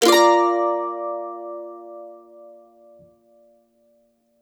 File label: CEE CHORDS A#, Blues Guitar Samples